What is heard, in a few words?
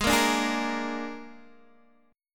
G sharp Suspended 2nd Flat 5th, Guitar Open D; x,11,8,8,11,8